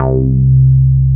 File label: SH101; OLD ROLNDS